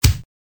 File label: kick